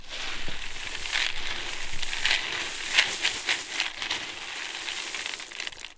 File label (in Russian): zvuki kofeinykh zeren